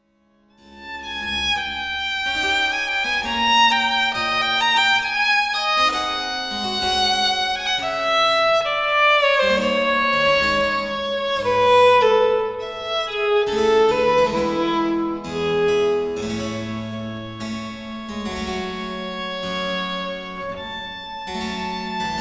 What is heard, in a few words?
Sonata in G minor Adagio